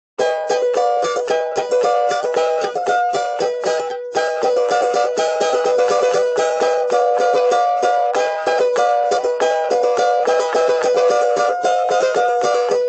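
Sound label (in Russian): Zvuk balalayki, balalaika sound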